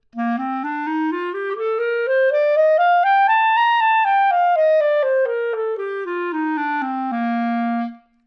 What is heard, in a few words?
c major